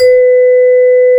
Vibes E3

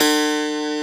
Piano E0

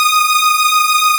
Synth Strings G4

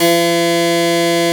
Harpsichord Ab1

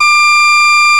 Contra Bass F#4

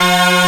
Bag Drone A1

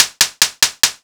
Noise Slap

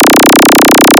Filter Snap

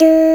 Doo G2

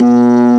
B3 Lo Dist Slow A1

